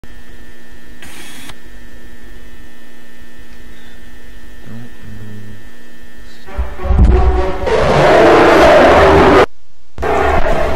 Backroom Monster Sound

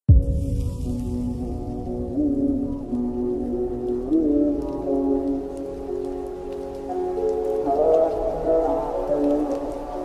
slowed reverb rain

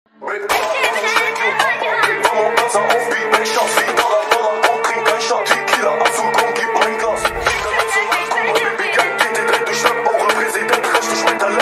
drill remix

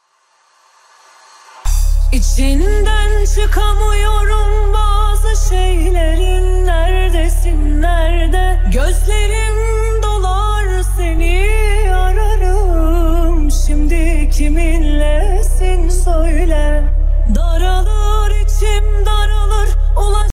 remix

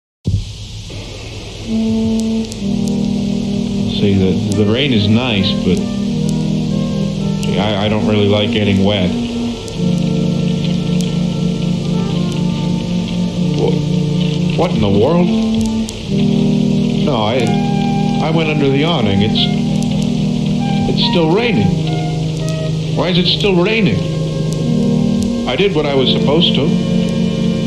rain

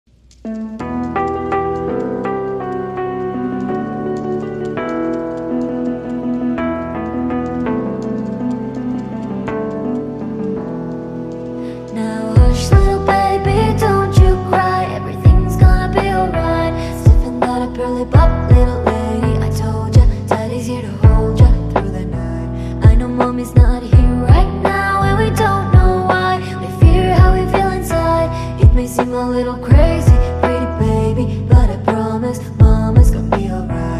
lofi cover

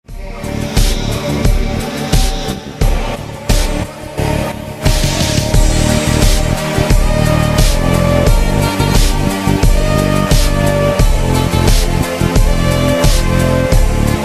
instrumental

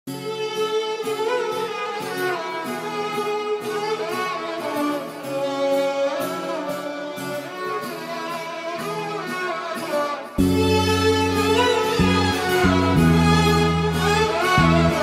turkish arabesk music beat

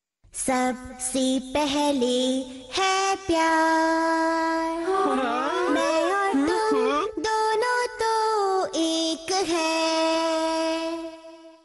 song hindi

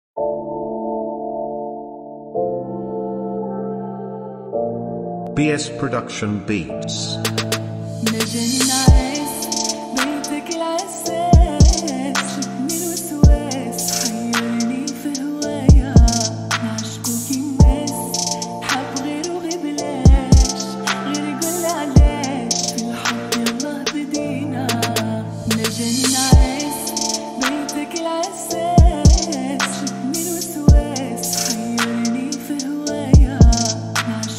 sad Arabic

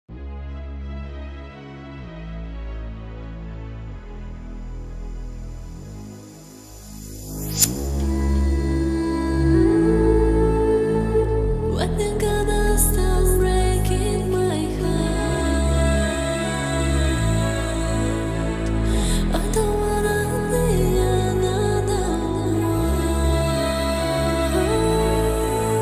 slap house remix

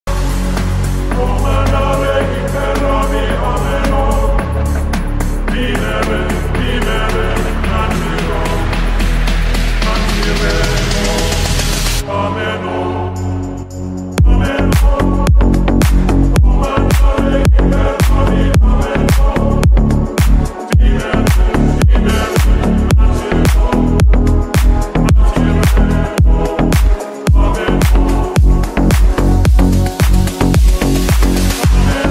remix; car video

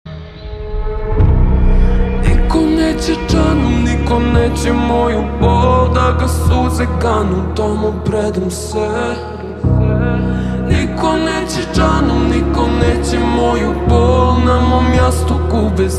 loop slowed reverbed

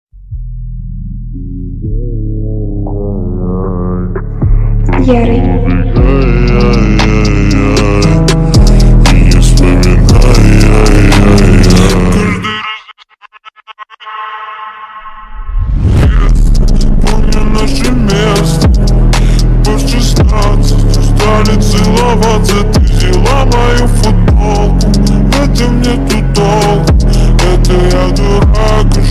remix bass

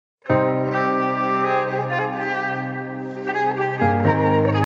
piano

